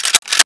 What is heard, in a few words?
shotgun cock; m3